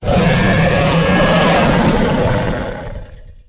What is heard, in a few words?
Dragon Roar; CastleMiner Z; Xbox 360